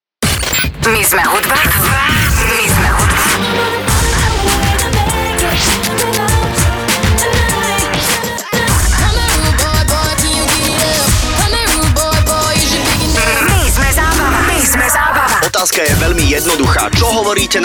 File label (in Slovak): jingel